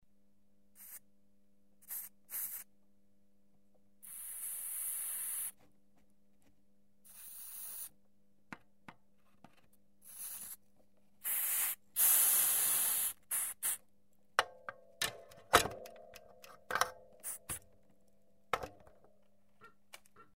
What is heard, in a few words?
FX Loeten soldering